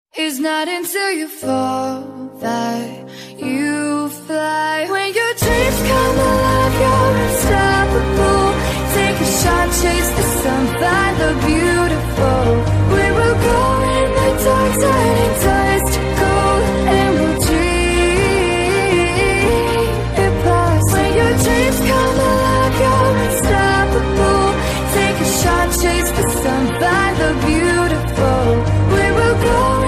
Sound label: huawei ringtones default